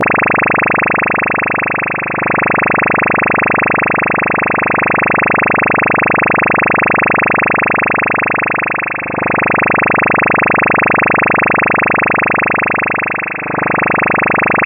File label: othr bw 4k0e 25 sps